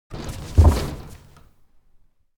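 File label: slide door fit; anemoi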